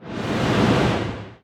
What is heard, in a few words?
Mushroom Roller Charge